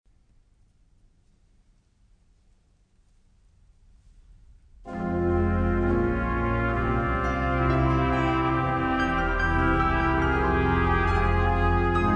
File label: Alleluia, Alleluia, mannenkoor